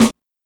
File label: acoustic snare a key, Royality free snare one shot tuned to the A note. Loudest frequency: 1277Hz